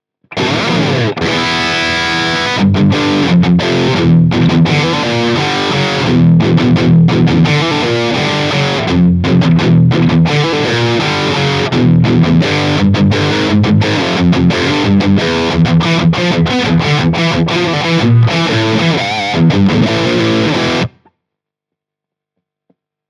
Cable real distorsion

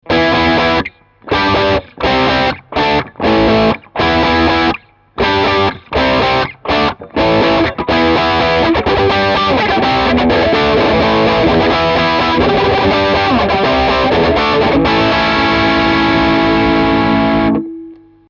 Strat Am Pro hard puente

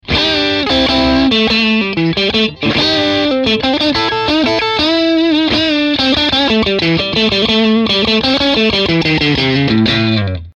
Strat Am Pro Dist medio mastil

